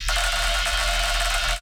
Knock Knock, bass

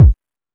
Techno B, Drum Machines, EMU XL-7

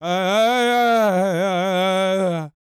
World Voices, CHOCTAW

